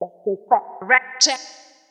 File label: VOICE GRL HOUSE 125 A, House Voice